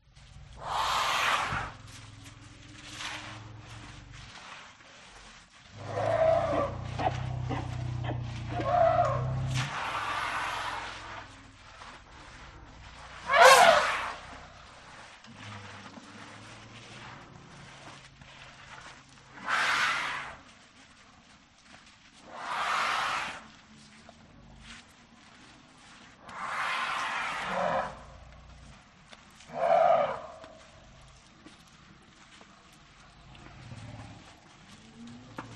Elephant; audio hero

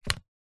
household book minature set down table; zapsplat

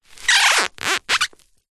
office sticky tape stick rub squeak to carpet

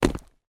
footstep dry forest floor; foley

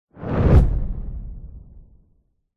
sound design whoosh flames fire cinematic; zapsplat

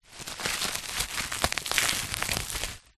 bubble wrap crumple handling

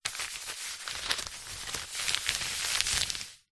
wrapping paper rub smooth out with hand

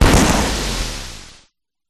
Glitch Decimated; Glitch Factory 01; sound spark

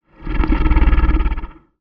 horror monster snarl

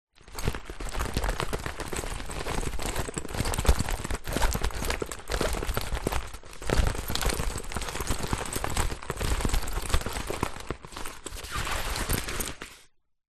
foley bag camera bag small movements

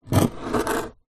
foley stone scrape wooden surface; zapsplat

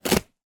cardboard sheet rip; foley

